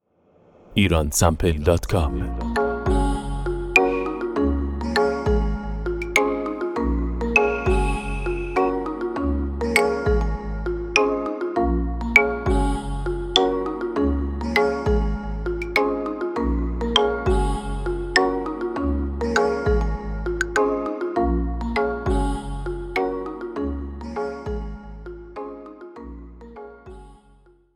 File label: claves